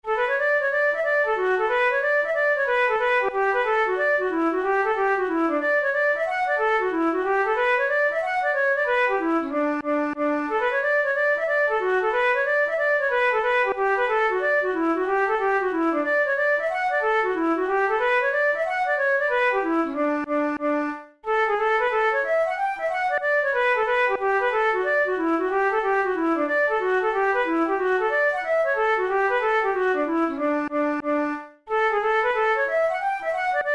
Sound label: hornpipe, flute tunes